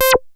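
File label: SHORT ACID, BASSES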